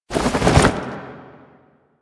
APC Jump Off Front Right; Enemies; Lasrian; Warframe

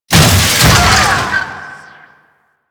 Necramech Ranged Knock Down; Enemies; Warframe

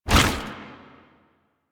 Tank Infested Arm Move Fast; Enemies; Movement; Lasrian; Warframe

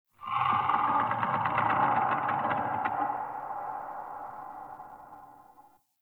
Corrupted Ode Three Trees Talk; Ambience; One Offs

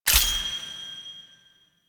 UI Mod Install C; Tennokai; DE; Warframe; Mods

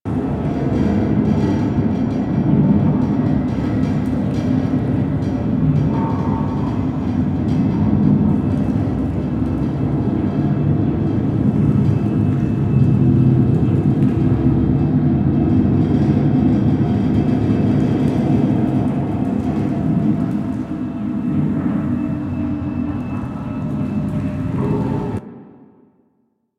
Galleon Generic Large Amb; Warframe; Ambience; Grineer Galleon

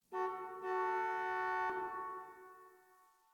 Parking Lot Distant Car Horn; Warframe; Ambience; Nineteen Ninety Nine

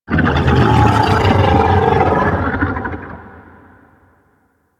Golden Maw move; Warframe; Ambience; The War Within

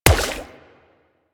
Water Sprayer Punch Layer; Warframe

